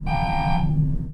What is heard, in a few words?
elevator beep